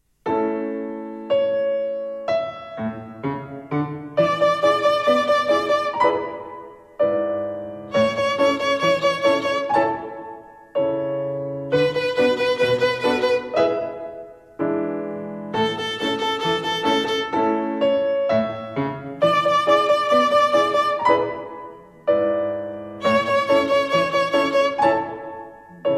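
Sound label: Rhythm with Three Fingers Fifth Twinkle Rhythm, violin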